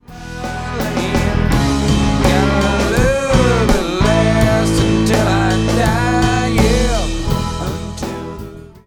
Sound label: Ride Cymbal; southern lady